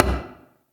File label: egg Flat Miss